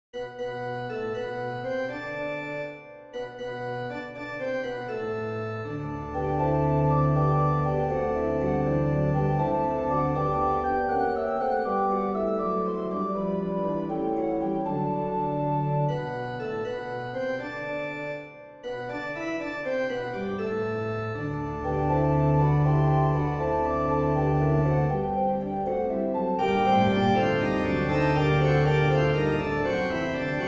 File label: Improvisation; Marcel COURTONNE